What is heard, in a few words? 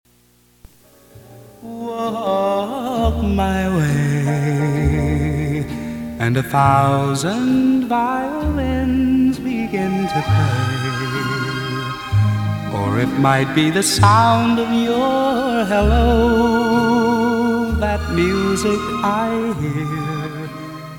misty violins